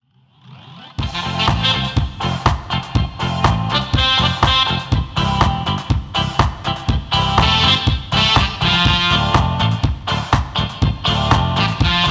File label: reggae